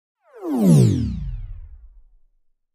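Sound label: Descend Short Electric, descend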